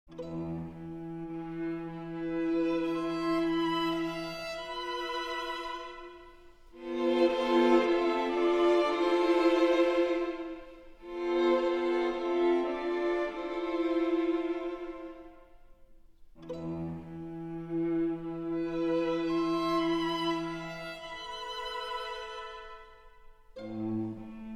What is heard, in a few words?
String Quartet No. 5